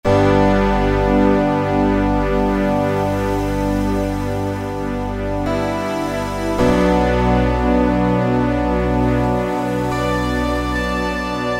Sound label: Wispa, Yamaha TG33